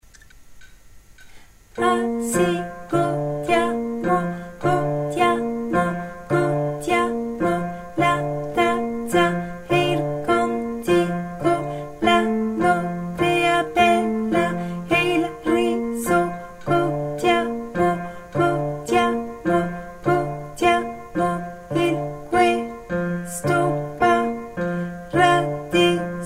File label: soprano